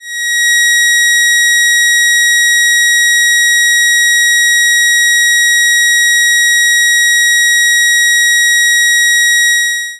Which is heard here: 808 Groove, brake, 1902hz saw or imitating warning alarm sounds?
1902hz saw